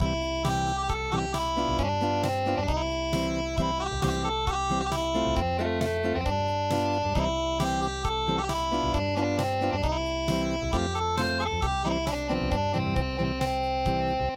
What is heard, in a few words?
The Colorado Trail Unplugged